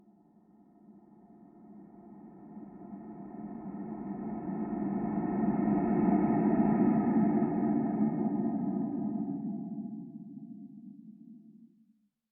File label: Tension Builder